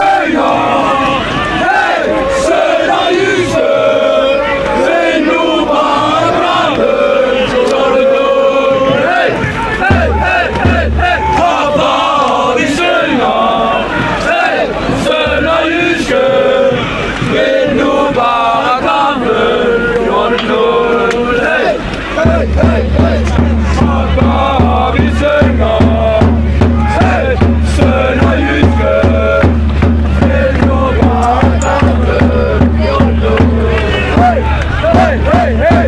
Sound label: crowd chant, fanchants